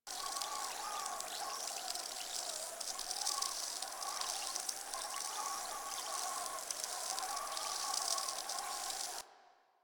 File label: Infected Motes Loop, Swarm; Warframe; Infested